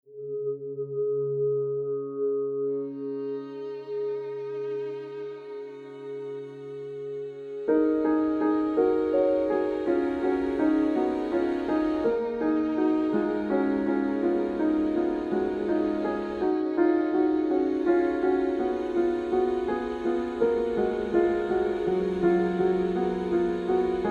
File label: Instrumental